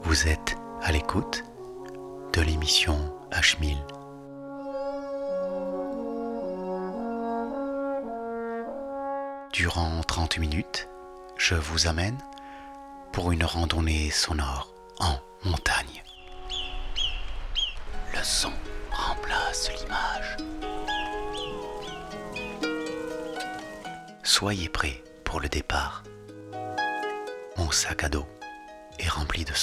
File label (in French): Lamothe vent arbre qui craque chevaux prairie ambiance fourmilliere